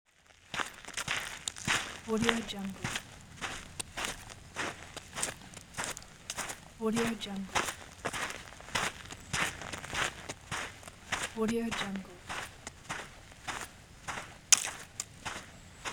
walking on a dirt road; urban sounds

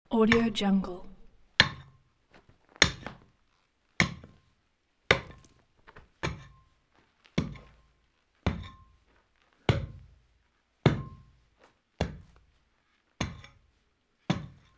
hitting pickaxe in mine; ضربه زدن با کلنگ; industrial sounds

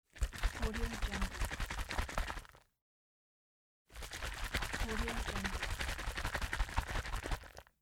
lotion bottle shaking; domestic sounds

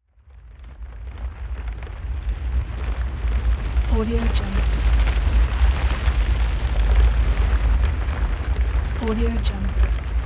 earthquake

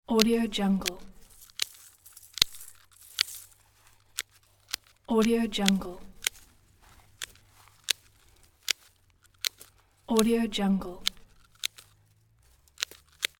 pruning plant; domestic sounds

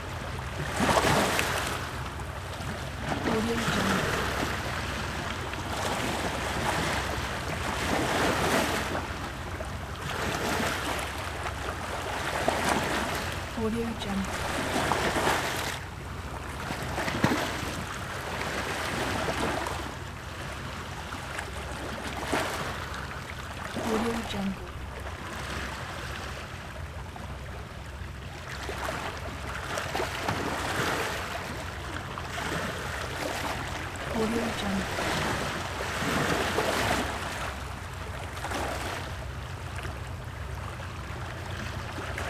seashore waves

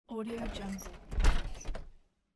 garden shed old door closing; domestic sounds

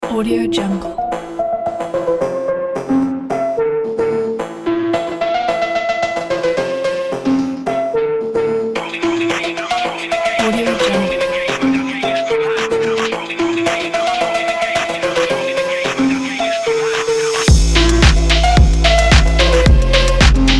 the phonk; موسیقی بی کلام

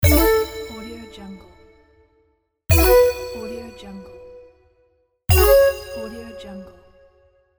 ethnic power up; game

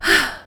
vocal breathe human female breathing exhale; human sounds; rogue

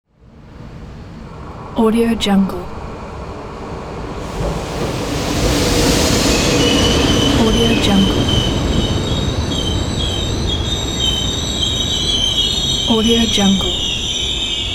train brakes squeaking

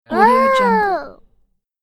kid in wonder; بچه ذوقزده متعجب; human sounds

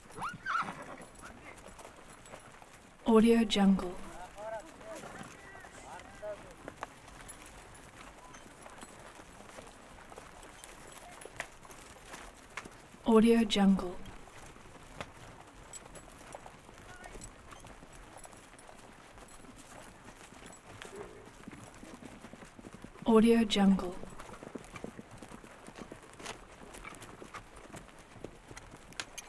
military cavalry horses walk slow mingle; game; حرکت آرام و شیهه کشیدن

